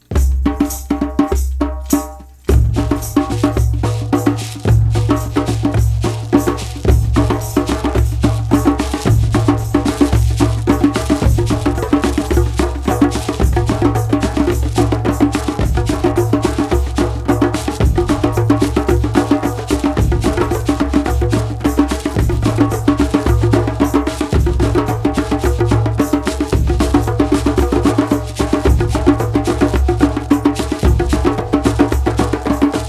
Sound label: Shaman Beat